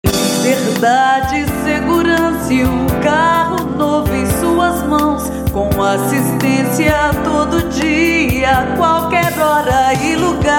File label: jingle; localiza